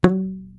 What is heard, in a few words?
pvc Pipe 35 inch Sound Audio